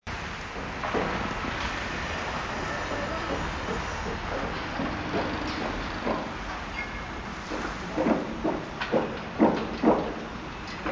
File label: ambient construction; fowl